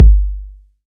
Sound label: solid kick drum G key, Loudest frequency: 91Hz; kick drum samples